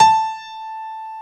A5, PIA; Best Service Gigapack 1

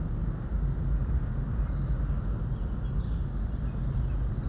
Ambience Loop, outdoor

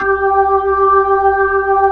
B-3 G 5, Jazz; Keyboards of The 60's and 70's

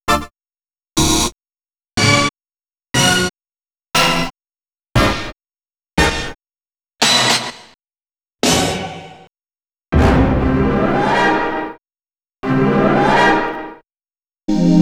hits + chords, ravers; X-Static Goldmine; ravers (chains)

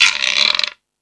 Percussion, Drum Machines E-MU Pro-Cussion

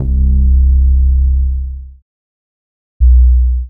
Boomer Bass; Sonic Foundry (Sony Creative Software); Knutrix Drum & Bass

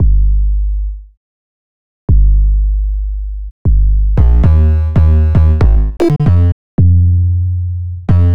Percussive Bass; Sonic Foundry (Sony Creative Software); Modular Electronica; Basses

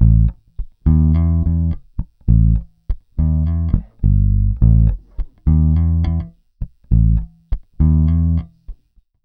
Pop, Bass

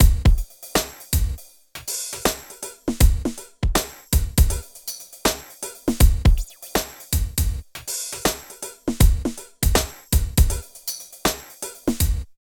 97 LOOP -L; Phat & Phunky; East West

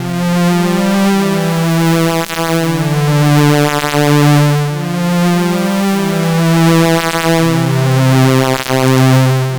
C64 Synth

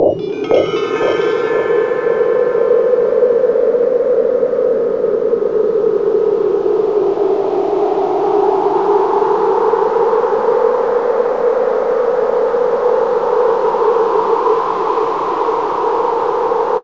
SFX, synth atmos & fx; Dream Zone

